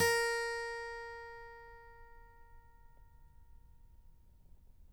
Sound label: A#5, Country